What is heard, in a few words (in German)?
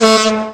D Horn 1 kurz